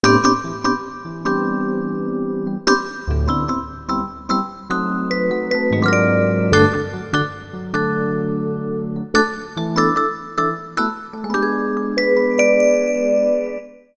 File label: Midnight Swing, ringtones; Galaxy Note 9